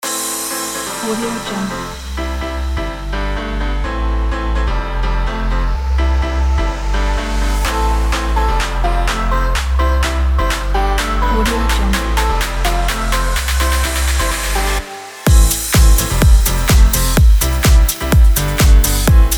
future house party pack

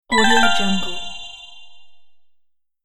ecommerce basket remove

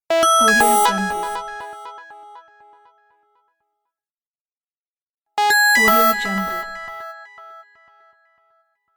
game sound

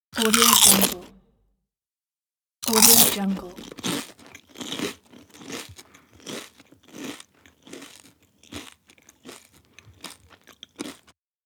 eating chips

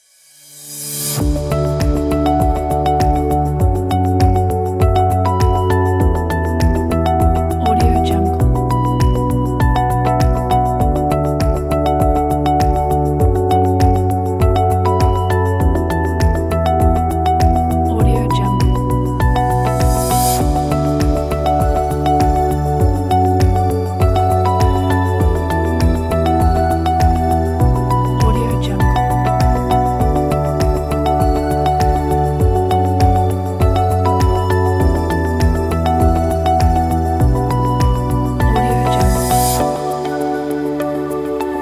science ambient hopeful; musicalgreen

